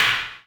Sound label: KIT HOU; Best Service; Dance Mega Drums